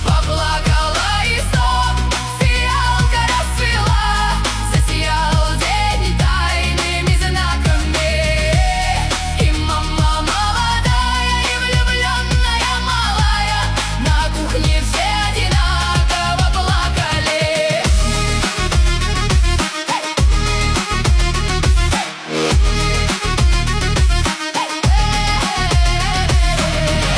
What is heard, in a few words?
Folk drum Bass cover